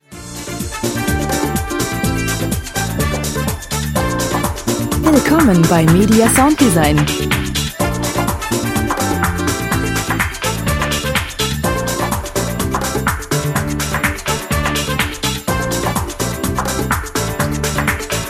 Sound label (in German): urlaub brazilian; heat